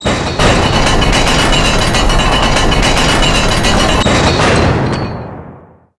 medieval door open raise; Garry's Mod; tf2

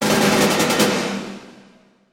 quest operation pass buy

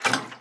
throwable slosh; weapons; breadmonster

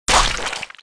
gore; proj impact; the monster plane; kolmods

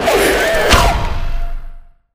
critters jumpscare; female pianosaur

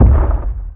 footstep; brainrot; italian brainrot 3; roshan

